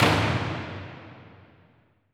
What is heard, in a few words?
Mahler Hammer; percussion; Cymatics - Infinity Beta Pack 2.0; Zeus Percussion Collection